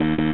Menu Fail Error; Menu FX

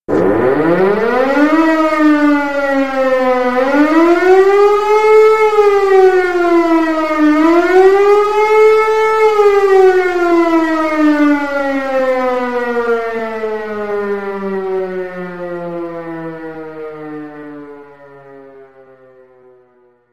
alarm short; teaser; Apotheorasis

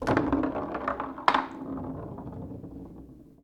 Foosball Ball Hit

